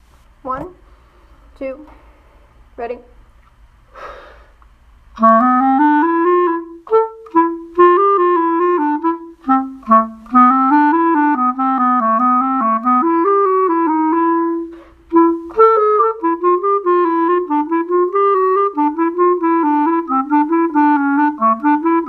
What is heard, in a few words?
horn